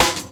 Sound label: SD, dance drums hip